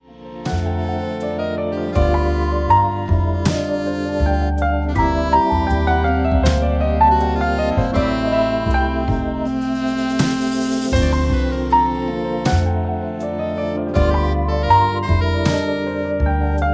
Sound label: Lazy Jazz; MIUI12